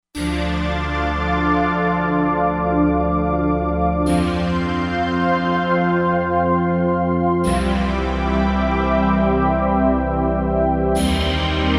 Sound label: Pad Wave Pad